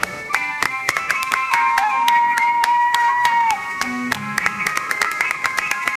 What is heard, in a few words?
clown music